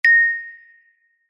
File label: alert bell ping wooden